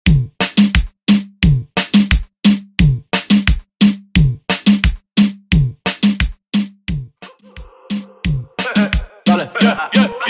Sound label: Remix 88 BPM